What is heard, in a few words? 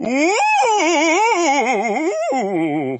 oooooo; mal damba paladins